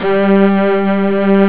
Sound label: string; chipmusic; sonix; Rise Of The Dragon